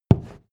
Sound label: step wood step